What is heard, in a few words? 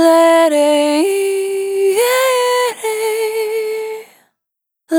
Single Voice Melodic Loops, Vocal, Dry